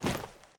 armor; smite; horus

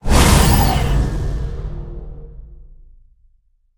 activate; smite; heimdallr

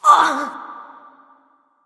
grunt hurt; smite; kali

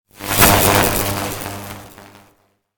projectile; smite; god raijin

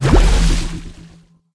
victory water enter; Smite; Sol

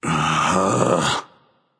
"ugh"; smite; voicepack; poseidon

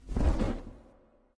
wingflap; smite; thanatos

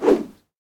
minion swing big; smite; npc; roman archer

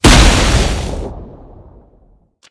kraken hit; smite; poseidon

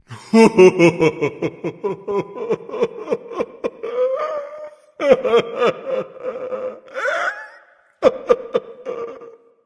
boohoo; smite; odin

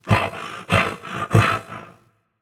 nv breath; smite; voicepack; ganesha

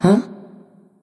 surprise; smite; voicepack; medusa

